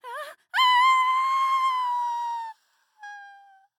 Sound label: Falling; paladins; Fairy